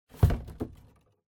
Cardboard Box Put Down Empty; ダンボール03-13(置く 空); 効果音＞梱包材

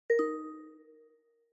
OS Sound (Speaker-Notification)

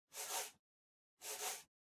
PC Mouse Move Far Soft Pad Short

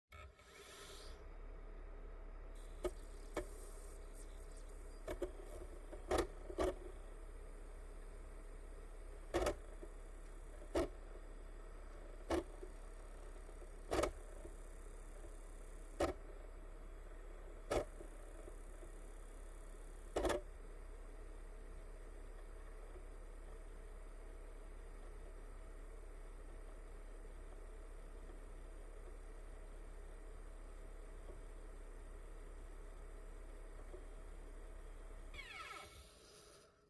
Optical Disk Drive Operating Noise

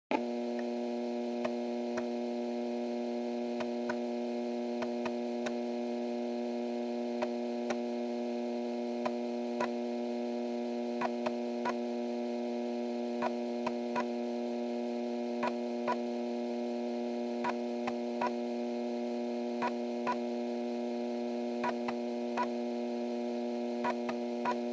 Floppy Disk Drive (Operating Noise)

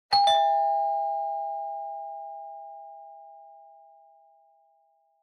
Doorbell Mech Tone, Close

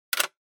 Camera Instant Shutter

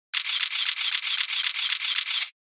Camera Phone

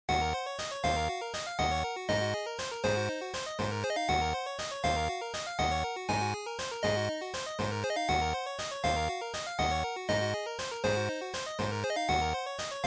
GB RPG Dungeon4

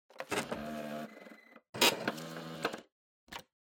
Time Recorder Print

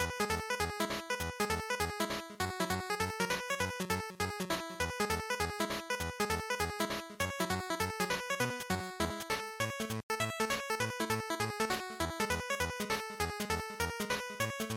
GB General Title Loop 100

